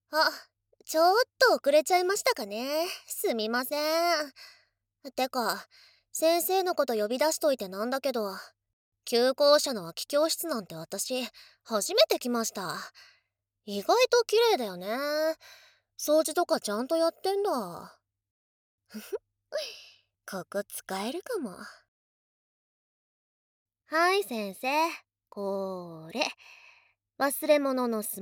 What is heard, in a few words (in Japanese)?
クスクス笑ってからかい耳舐め, ASMR